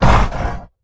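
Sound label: Stealth Attack Grapple; Evolve; Goliath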